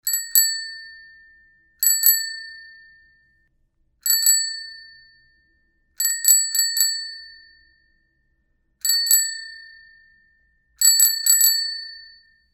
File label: bicycle bell